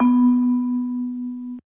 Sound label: key